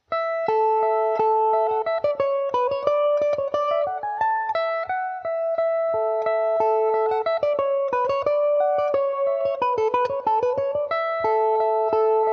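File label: A mixolydian, Irish mandolin; ger the rigger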